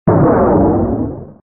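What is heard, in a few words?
Rolled up and disappeared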